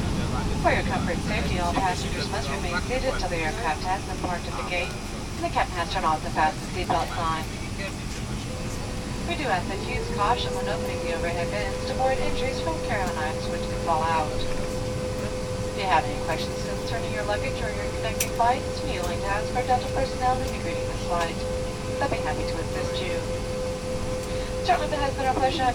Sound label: airplane off people welcome; best service Blue Box